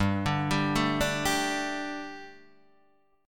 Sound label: G Suspended 2nd G, Guitar; Gsus2 Chord {3 5 5 2 3 3} arpeggiated